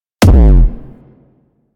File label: Briefcase Launcher Punch; Warframe; Weapons; Corpus